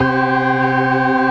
STOPS, Synth and Organ

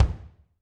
BD, Drums; Bob Clearmountain Drums I

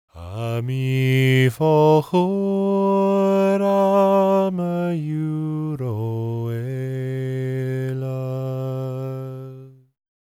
GAELIC, World Voices; ILIO; Vocal Planet

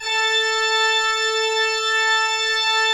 Cathedral Organ; Propeller Island

